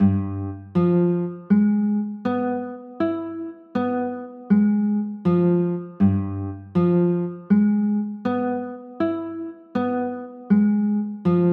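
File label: G 13 b9 chord, guitar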